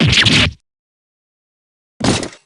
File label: fall; dgs2; drebber